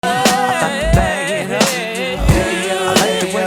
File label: 16 notes eh heh oo